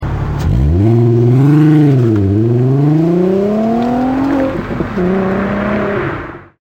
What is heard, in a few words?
mk4 jetta vr6 drive off